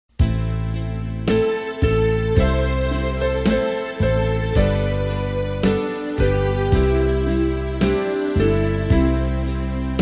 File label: BASS; Đáp Ca